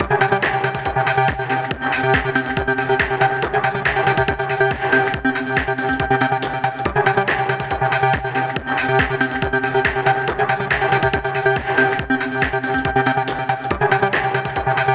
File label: BoomBox loop